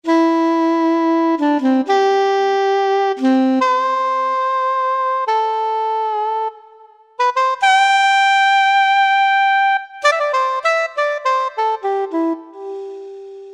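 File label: Soprano Soft, reed, Yamaha EX5R; Factory presets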